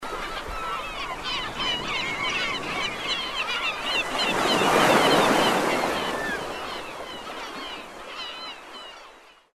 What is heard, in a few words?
sea efx